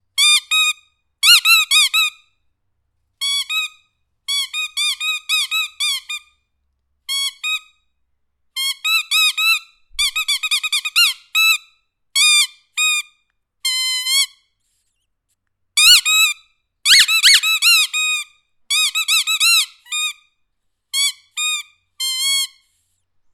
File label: Squeaky Toy